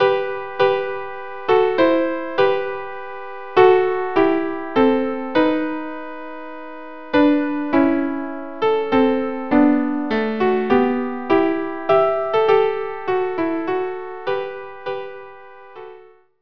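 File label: violin violin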